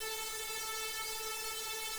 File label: comb